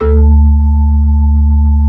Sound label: B-3, Blues, Keys of the 60s and 70s